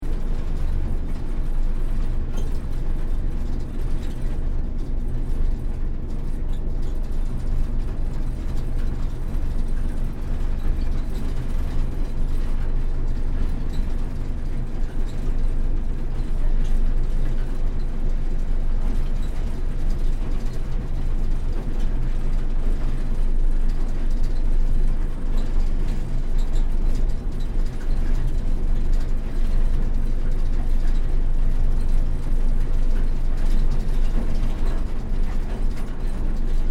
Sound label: Ambience Train Diesel TGR X Class 1951 Cabin, Engine cabin of the X class Di; Train Station Sound Effects; Foundation1